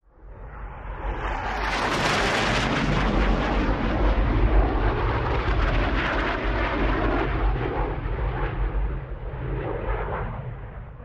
AV8S FLYBY, AV-8B Harrier

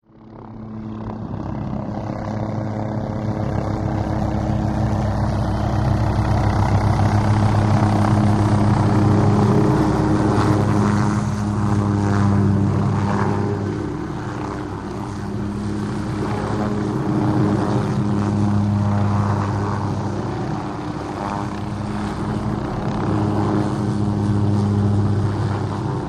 OH6 Loach Hover By Slo, little bird

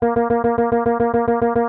Ascending Xylophone

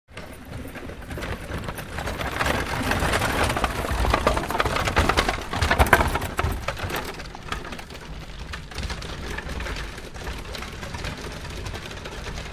Carriage By Fast Hard, carriages

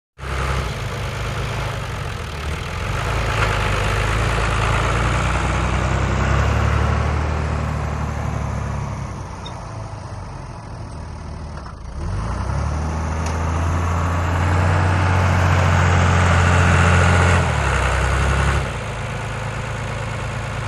Tractor Drive Back Fo

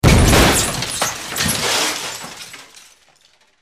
Medium Impact W Debri, metal & glass

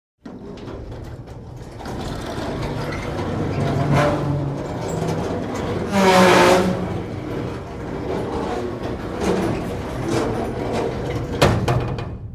Antique Industrial Dryer Drawer Close

